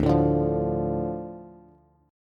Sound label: D Minor D Bass; Dm strummed; 10,12,12,10; JGuitar